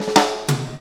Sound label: FILL SD, Ragga & Dub Reggae; USB Soundscan vol.27; FILLACOUS